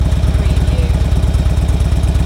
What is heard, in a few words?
Motorcycle Harley Davidson Idle Loop Engine Perspective